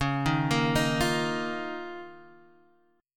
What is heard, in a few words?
F 7th Suspended 4th Sharp 5th Db